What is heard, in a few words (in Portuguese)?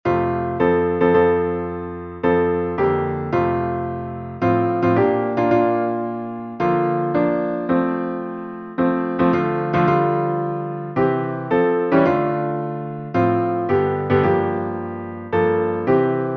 salmo instrumental